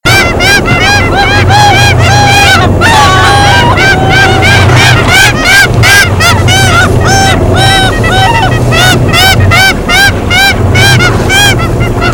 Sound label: Larus belcheri atlántica - Gaviota cangrejera